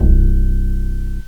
acoustic bass

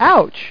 ouch

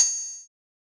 RIDE

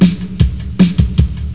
DRUM PAT

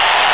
crowd

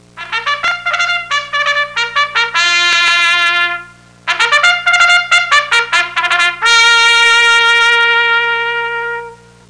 BUGLE CA, SPORTS; Easy Personal Web Page

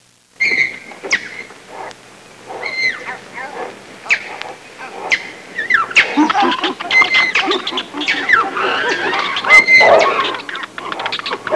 chimpanzee; jungle animals; Sound Effects for Multimedia - Volume 1

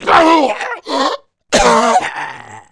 BLUE DIE; Messiah

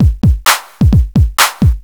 rap, funk, bpm130

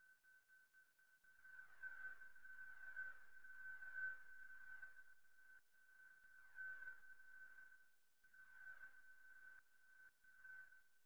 Wind; Onj's Soundfont

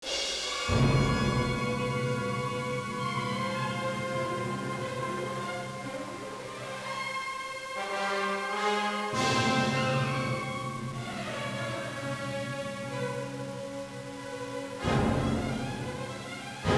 Mus

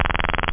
buzz; da capo vol1; effects rave

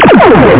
gun shoot; parasite

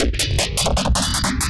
DRUMLOOP D+B 160, Drum+Bass Loop; eJay

